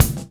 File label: drum; crakbone